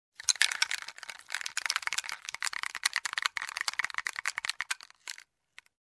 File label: Shaking a bottle with plastic inside